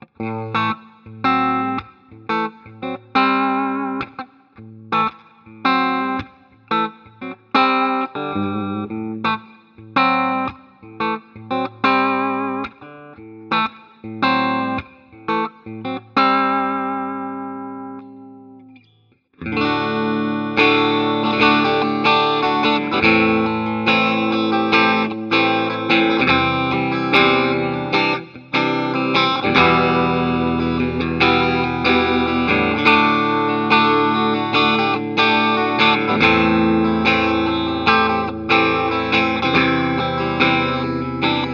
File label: Clean 1x12 Classic Ceramic 2x12 Boutique Twin; Scuffham Amps S-Gear; The Jakal